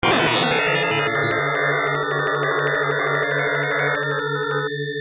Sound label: transients